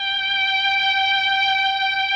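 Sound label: MELLOSTR, Mellotron Violins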